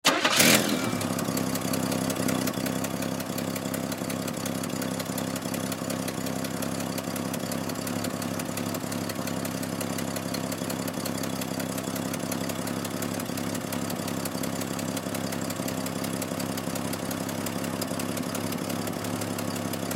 Truck Chevy Half Ton Stepside Pickup Start Up Idle Shut Off; 1973; Transportation Automobile